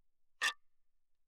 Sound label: guiro, toward, ff; Percussion; hand percussion